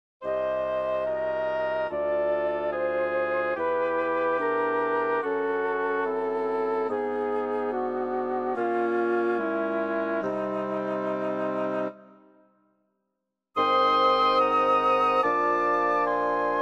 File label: Minor Triads Ascending E2 Alt Roots